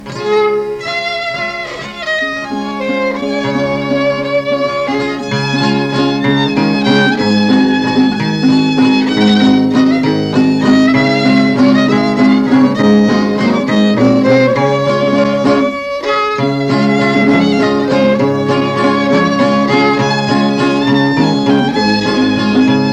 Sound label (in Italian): valzer chitarra violino